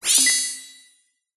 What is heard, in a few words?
science fiction cyberpunk robot or equipment beep; zapsplat